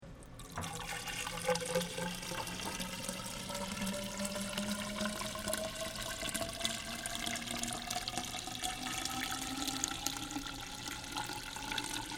Graduated Cyl Single

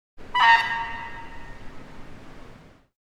Clarinet Eb-D

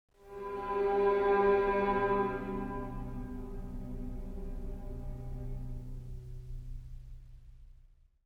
trem conv violin G

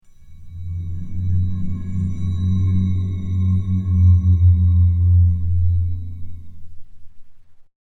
drum rub

